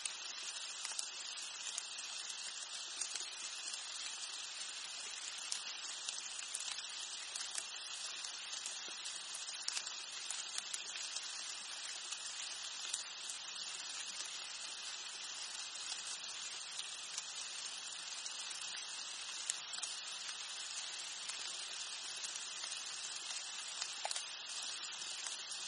Soothing Nature Sounds, Rainforest Sounds, Summer Rain Showers